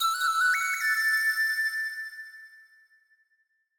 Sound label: feather get music